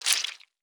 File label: Squish; Treasure of Nadia